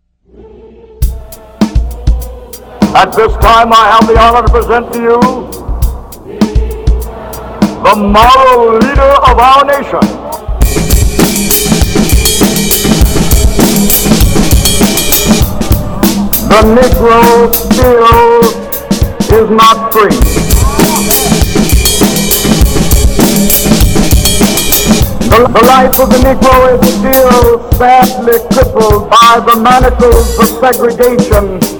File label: drum; I Have A Drum (Martin Luther King, 28/08/63); Druma